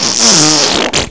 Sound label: pain; quake2; railz